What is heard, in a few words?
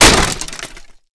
DMG TREE